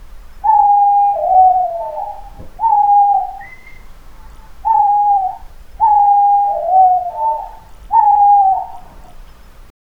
ein uhu der in der, owl

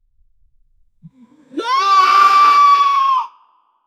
ein einzelner Freudenschr

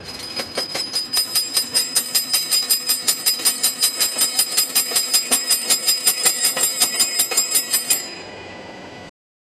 die Weihnachtsdampflokomo

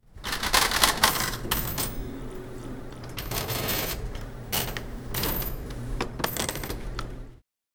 kassenklingeln katsching, cash register

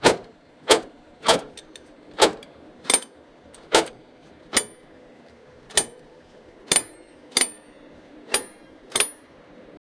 typische gerusche in eine, mechanic

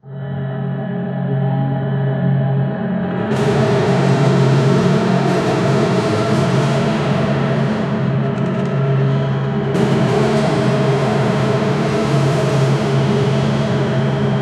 dramatische dstere demoni, demonic

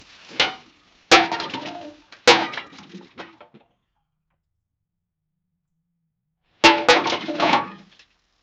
ein Eimer kippt um; domestic

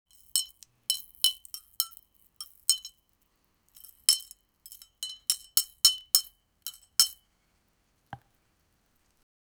eine wassermelone aus, fruit

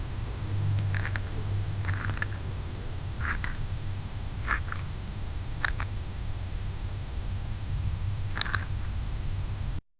die Maus, rodent

